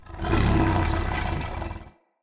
Corrupted Battle Dog Attack Breathe Snarl Active Idle, Animals, Vocals